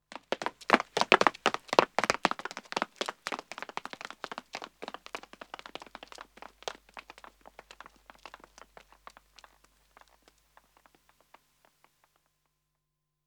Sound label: Pavement Running 2 men depart, Footsteps; BBC Sound Effects Library